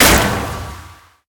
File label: hit silence; smite; thanatos